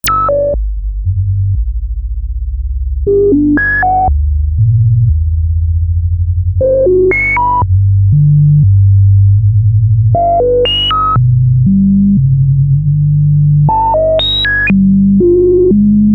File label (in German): DM12 3x Drift Mod Seq Cutoffsteuerung